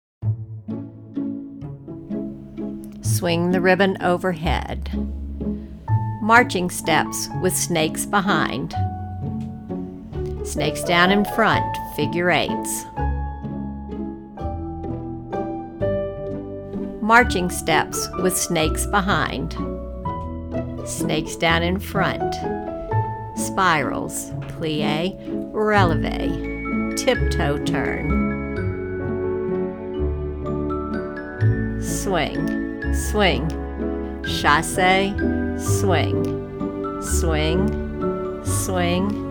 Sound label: LEVEL C RIBBON voice